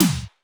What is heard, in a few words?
Korg 01W MegaDrums; 300 Drum Machines